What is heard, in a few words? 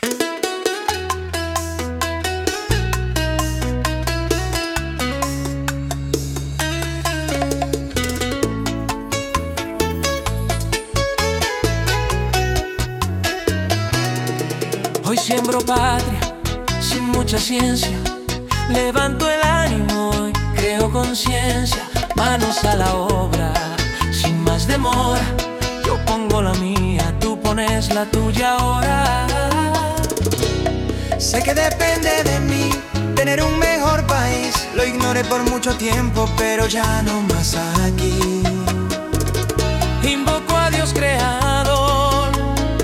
Bachata